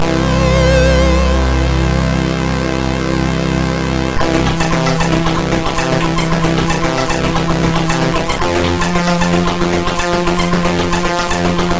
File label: E-Load loader, MOS6581R4; hvsc